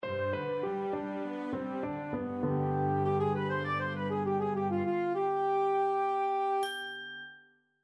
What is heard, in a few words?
Oriental